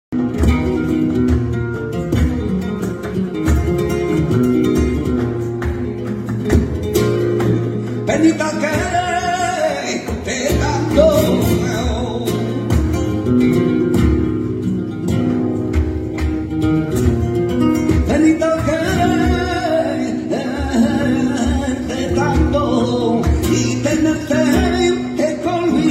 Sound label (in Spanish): letra solea x buleria